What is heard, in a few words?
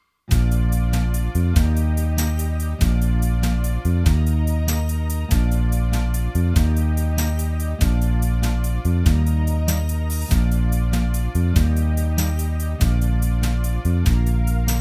12/8 Pop